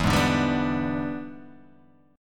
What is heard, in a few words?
E 7th Sharp 9th Guitar Open G, chord